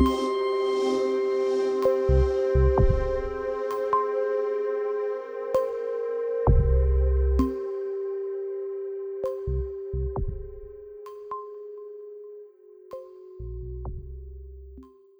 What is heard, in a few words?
Sunset Pads